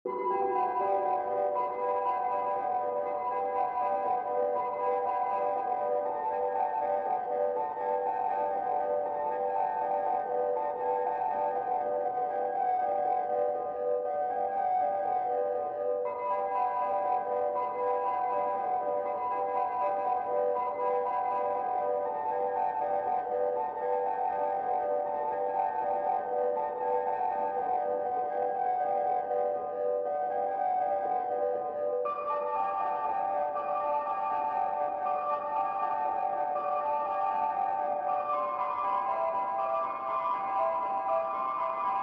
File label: DCO-106 Single Synth Loop